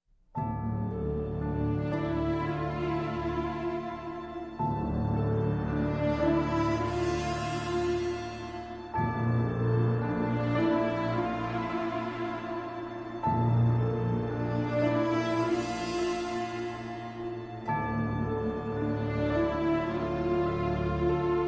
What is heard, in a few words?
Orchestra Choir Tracks, Entracte